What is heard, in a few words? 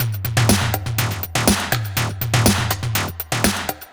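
122 Old Skool Jam No Bass Drum, Drum Loops; 122bpm; Nu Dance Electro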